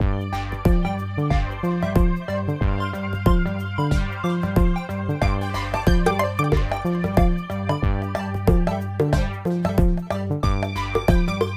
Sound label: playing; Hoggy2; PS Vita